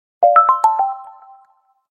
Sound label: Ringtone; Bmobile AX535